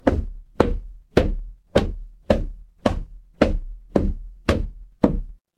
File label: footsteps stairs